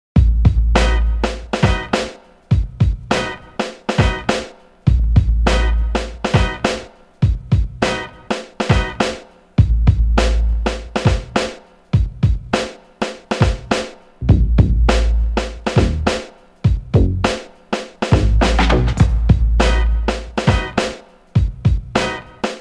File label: Bonus Beat